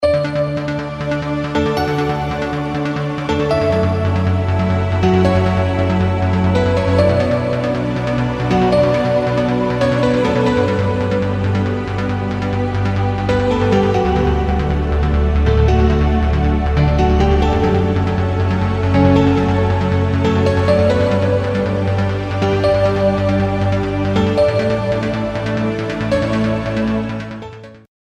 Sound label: Samsung S6 Melody